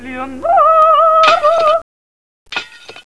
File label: music in; Leonardo The Inventor; Broderbund; Riverdeep; MONALI